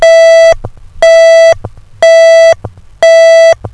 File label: tone